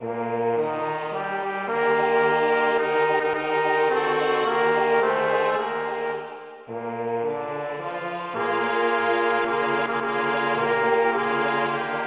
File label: polyinstru; hymns; Himnario grande